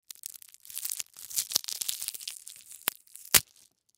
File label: Shrink Wrap Plastic, Shrink Wrap Plastic Protective Cover Peel Off Slow; Foley; Blastwave